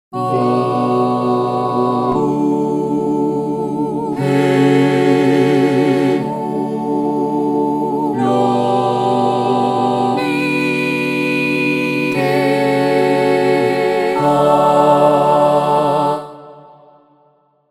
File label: tiki voices; tiki instruments; chiqui audio